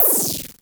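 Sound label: Klone Dual Percussion Synthesiser noise; KLONE FX NW8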